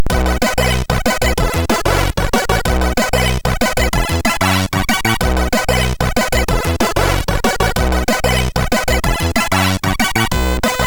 short tune; HVSC; MOS6581R3

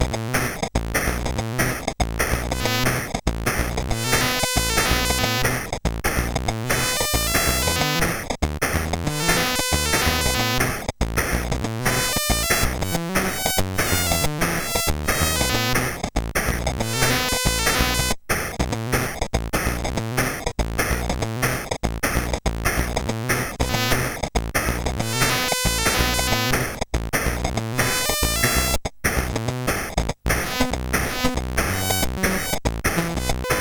Music Demo, CSG8580; sid; Anon Music Demo BASIC

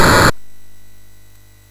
Dragons Den, MOS6581R3

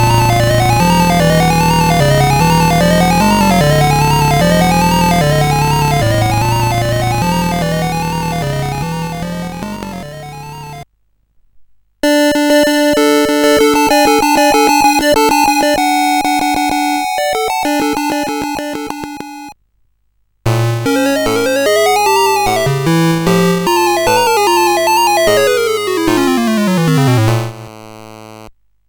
Musicbox, sid CSG8580R5

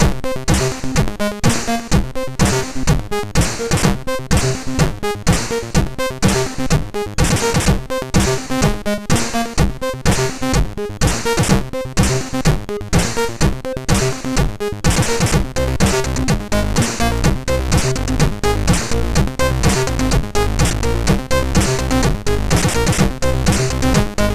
Ringmodulation, sid MOS6581R4

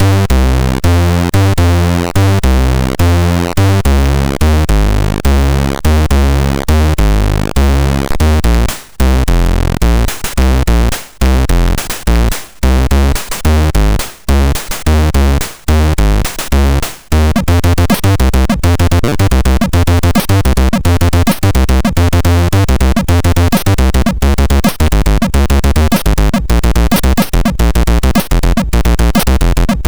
Modwheel Pitch Symphony; sid MOS6581R2

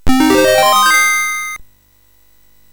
Loopz, MOS6581R3

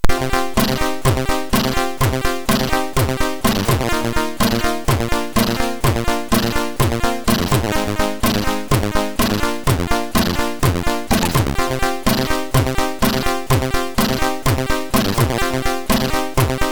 Intro Tune; MOS6581R3; hvsc; Moon

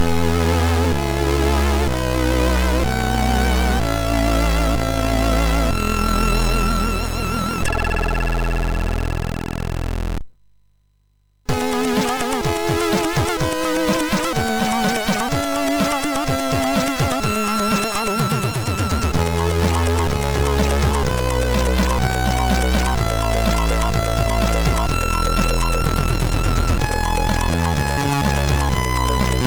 Funky, MOS6581R2

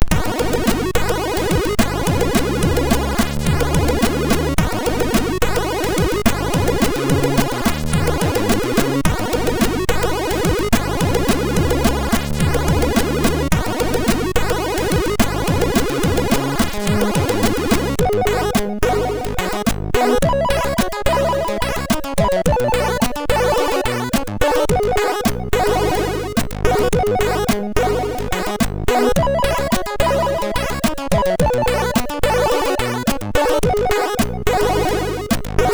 Note Music, MOS6581R2

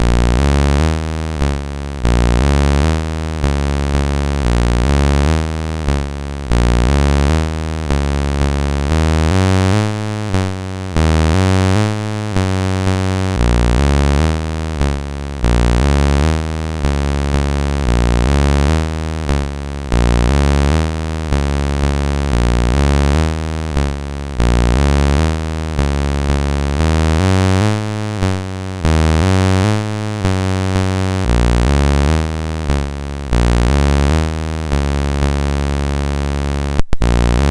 Jazz Bass Line, MOS6581R4

